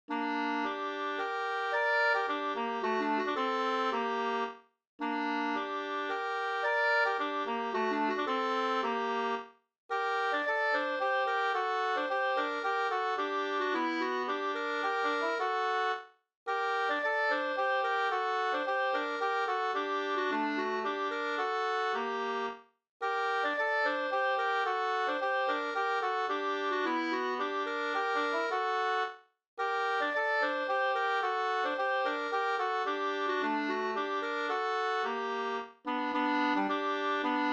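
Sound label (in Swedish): polska, tvåstämmig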